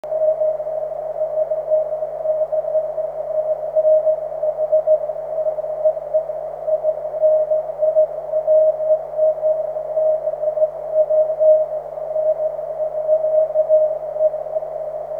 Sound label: cw, 23cm